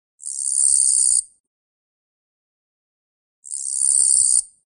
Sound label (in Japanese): キリギリス